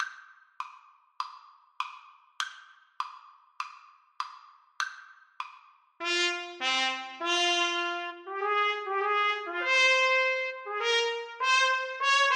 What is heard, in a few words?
trumpet duet